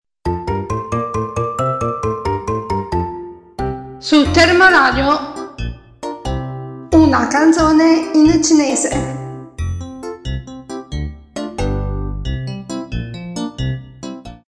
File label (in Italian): Canzone in cinese